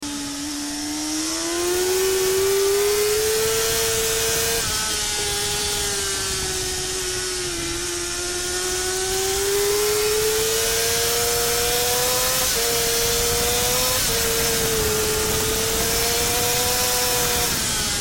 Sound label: CBR600RR